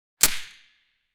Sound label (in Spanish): sonido de disparo de un, rifle